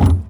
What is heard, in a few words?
Garbage Can Perc, percussion; Houston; Moody Park